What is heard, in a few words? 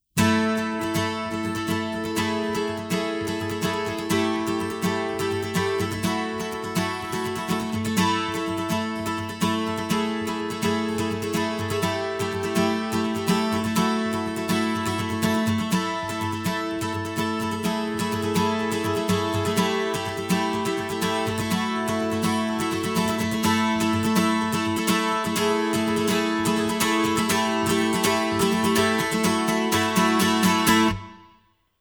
ACOUSTIC NASHVILLE; DOWNTHELINE